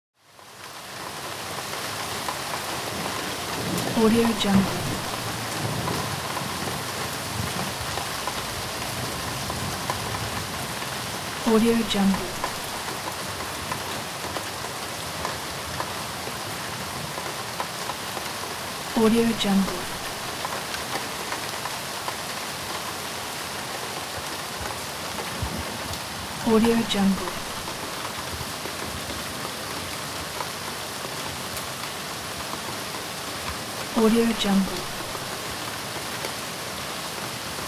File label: summer rain